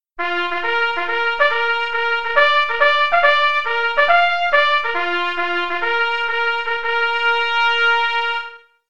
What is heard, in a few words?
Bugle Call Assembly